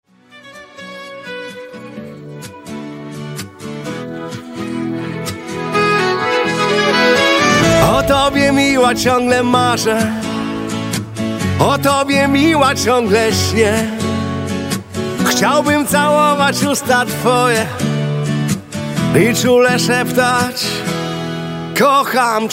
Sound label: Granica Folk Klub